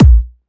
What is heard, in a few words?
Kick